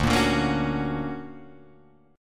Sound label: D Augmented Major 7th down Guitar Drop D